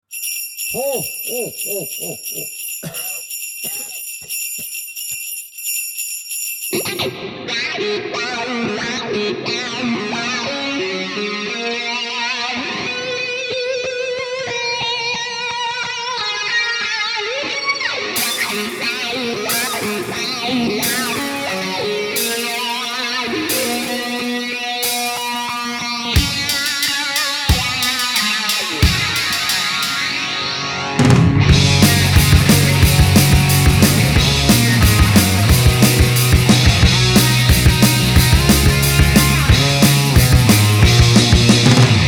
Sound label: Jingle Bells ROCKED, guitar rock; holiday guitar rock